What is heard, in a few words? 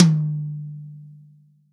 TOM, Real Drum Kit, POP KIT